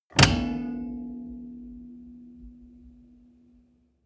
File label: car bmw e46 interior seat lock in ring